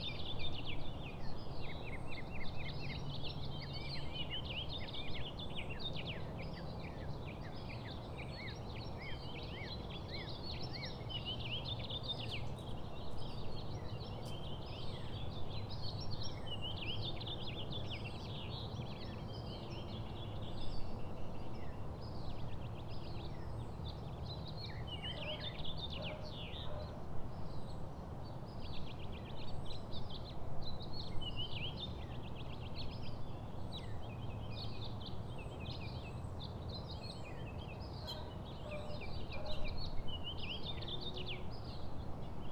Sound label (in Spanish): canto pajaros mañana sonidos agradables